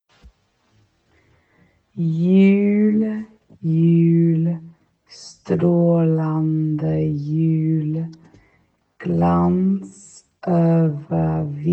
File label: slower